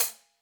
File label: marimba drum; ゆめ2っき